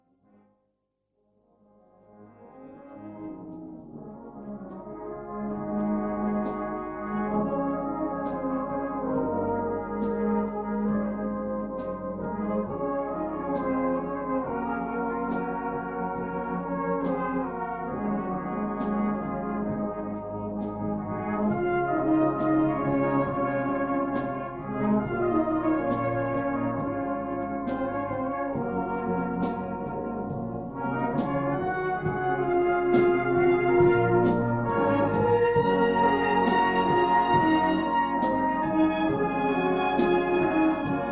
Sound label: oboe